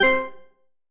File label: coin collect